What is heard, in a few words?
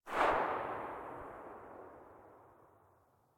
usp45 silencer mono very far